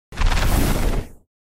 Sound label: Sci fi door spaceship hatchway